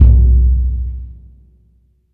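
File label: Burundi drum, Heart of Africa; Spectrasonics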